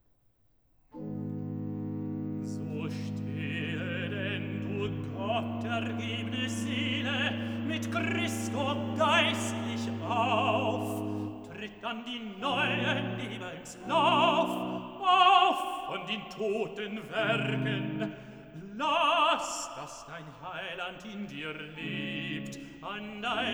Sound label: Cantata, Recitativo (Tenor); BWV 31 'Der Himmel lacht! Die Erde jubilieret'; Bach; Eisenach; Easter Sun & Mon